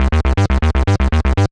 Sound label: Analog Bass; Ableton; Retro Synths; Analog Bass Vol 1